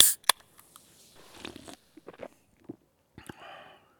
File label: soda can use; Escape from Tarkov